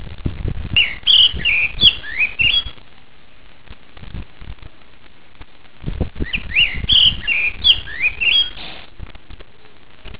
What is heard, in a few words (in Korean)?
흰배지빠귀 Turdus pallidus Pale Thrush